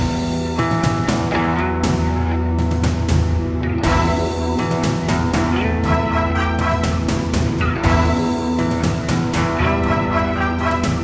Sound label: Instrumental